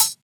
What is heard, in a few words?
Closed HH